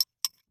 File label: Test Tube Set Down; Medical; Blastwave